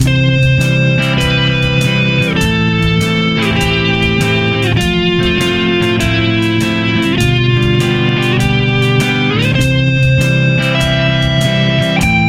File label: roots 3rds 5ths 7ths